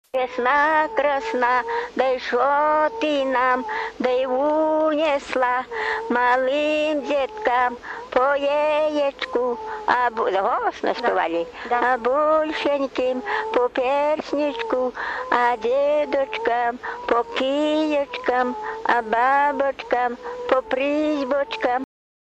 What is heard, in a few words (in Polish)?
hukanie